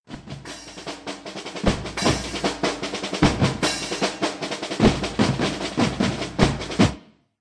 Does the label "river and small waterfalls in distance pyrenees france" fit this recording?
No